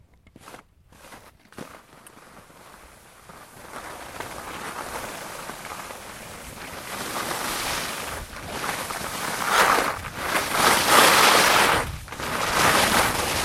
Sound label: Skiing; Great Outdoors